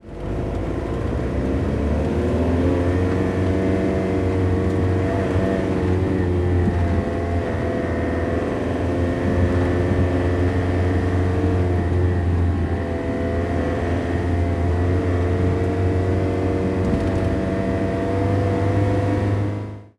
van driving interior perspective; transportation

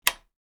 switch

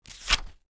page flip

